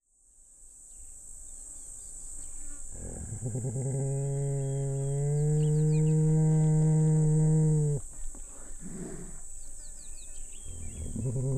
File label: moan spitting